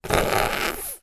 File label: foley balloon blow air rasp big hole